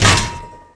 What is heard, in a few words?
bonk metal fence; terrains; Tony Hawk's Project 8; XBOX